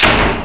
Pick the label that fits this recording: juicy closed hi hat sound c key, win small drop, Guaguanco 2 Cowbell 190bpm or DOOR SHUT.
DOOR SHUT